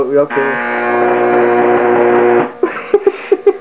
pig arc

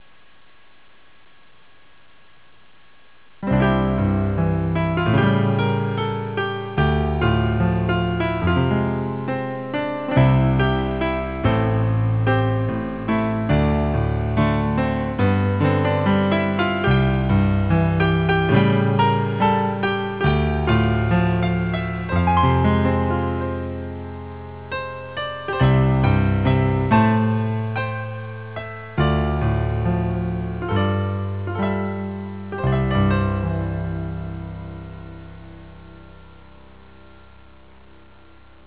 atmos; Affectionate Atmosphere; Oh! My Goddess

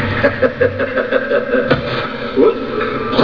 laugh

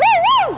whoo; Monica: WOO-WOO!

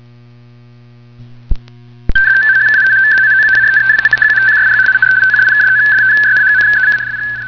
Rtty; RTTY Signal received on shortwave

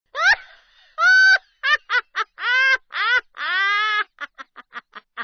laugh

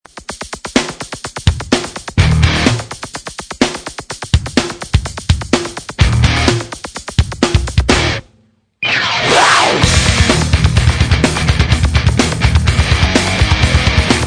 Entry Music; wrestling; Talon

